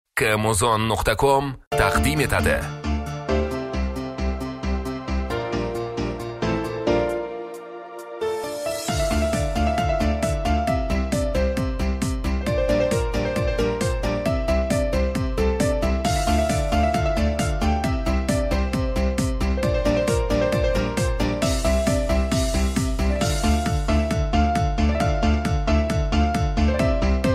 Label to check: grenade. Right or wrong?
Wrong